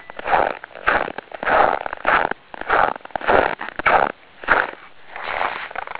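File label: asioto, footsteps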